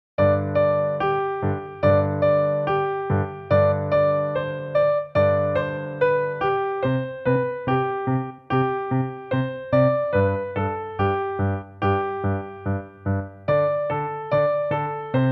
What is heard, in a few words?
ROCK BAND tempo